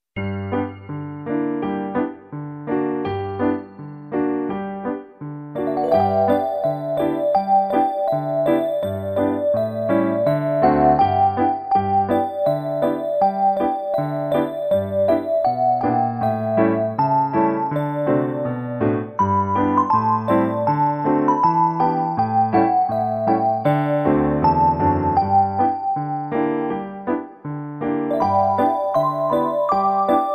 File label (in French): Douce cloche sol